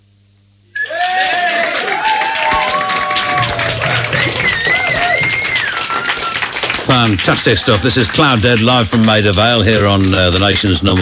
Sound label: Audience